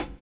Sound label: LO FI 8 HH 1; USB Soundscan vol.11; AKAI